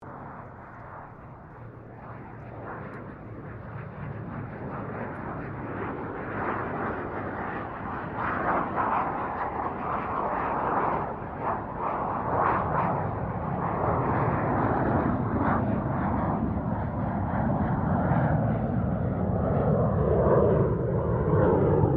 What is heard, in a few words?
Aircraft Gloster Meteor F Mk8 Pass Long, Gloster Meteor fighter jet; Airplanes; Vehicles